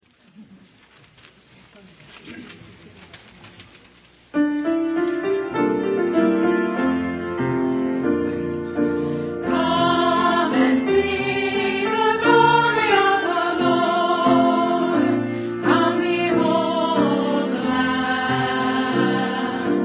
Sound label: choir